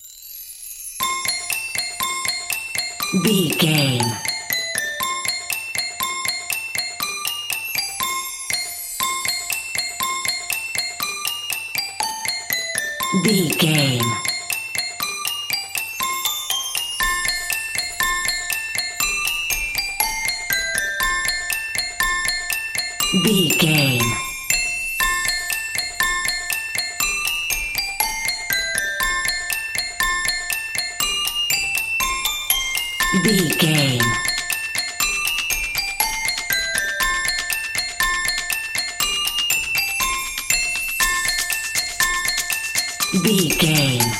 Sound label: children babies toys playing lulaby sleep happy bright celesta